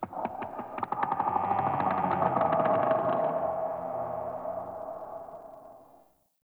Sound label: Corrupted Ode Two Trees Talk; Ambience; One Offs